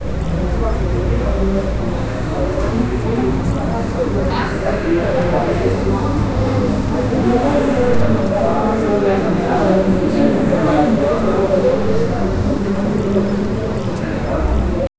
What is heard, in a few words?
im sitting in a cafe, background chatter